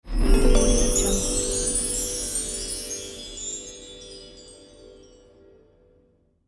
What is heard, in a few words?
luxury sound effect